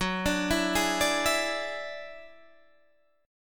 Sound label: F sharp 7th Suspended 2nd Sharp 5th F sharp down Guitar Open D